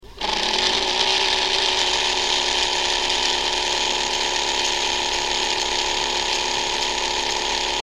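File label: toshiba bearing, hard drive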